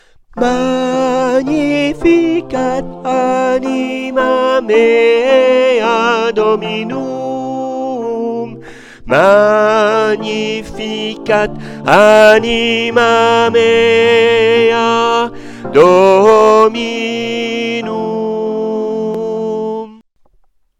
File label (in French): TENOR; Magnificat